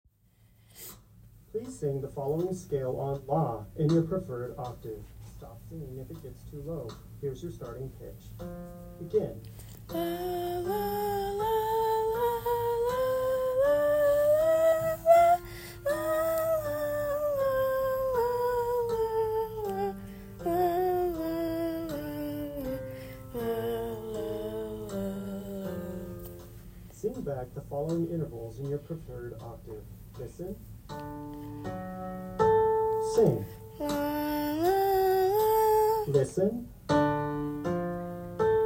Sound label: soprano; Audition